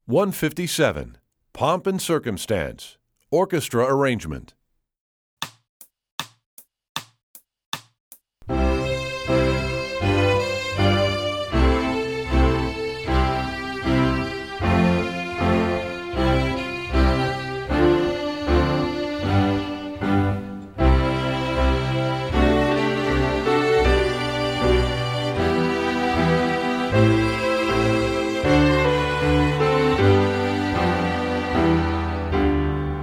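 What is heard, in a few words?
Orchestra arrangement; Pomp And Circumstance; EE2000 Book2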